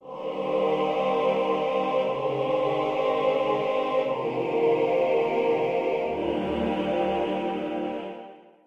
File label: All Parts, barbershop